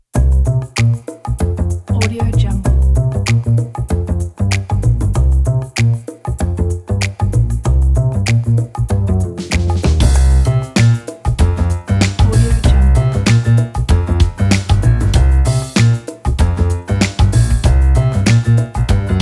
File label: vintage gang funk; istudio sound design